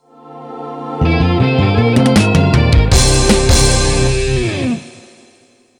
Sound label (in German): Jingle Arrangement